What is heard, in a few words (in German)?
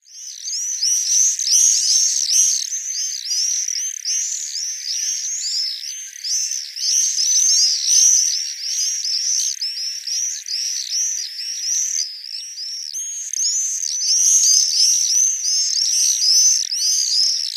Mauersegler